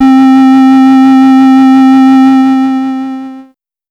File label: Casio VL1 Fantasy; Solo-Synths; Giga Samples Collection